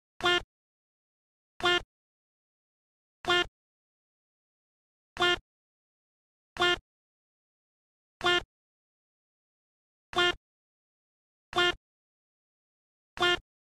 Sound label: duck sound; PewDiePie